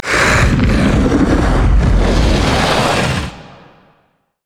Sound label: spider idle; LoL